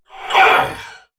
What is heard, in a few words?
Attack Effort; en; Warframe; Battle; Dax; DaxKnight